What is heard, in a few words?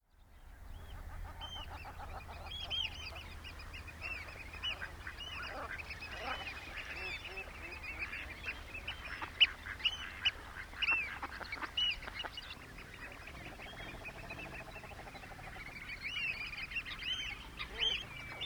Crane soundscape; The Crane Landscape; The Great Crane Project